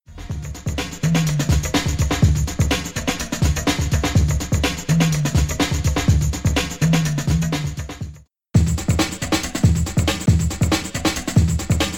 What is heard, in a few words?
Beat